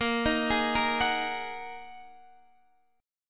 A sharp 7th Suspended 4th Sharp 5th, Guitar DADGAD chord